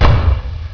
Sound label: prox bomb